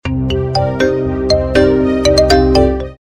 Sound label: startup; Plus GSM; Poland